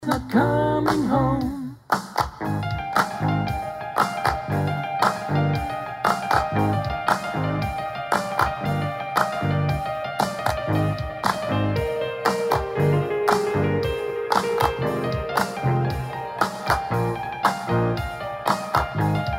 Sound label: prayer solo piano